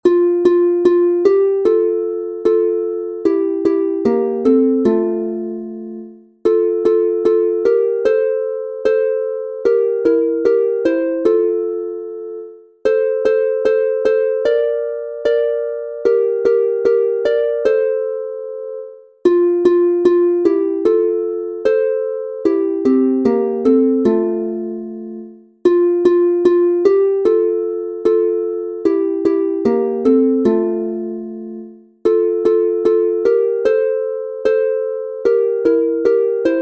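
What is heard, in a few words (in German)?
F Dur 2 stimmig instrumental, Harfe; Sommer Herbst u. Winterlieder; Spannenlanger Hansel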